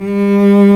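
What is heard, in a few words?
STRINGS SOLO, Lrg Ensemble; Roland L-CD702; Combos 1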